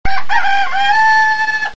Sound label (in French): Bruitage coq